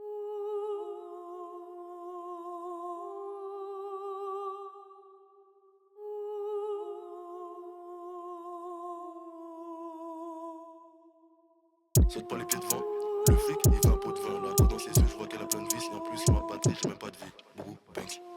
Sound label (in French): musique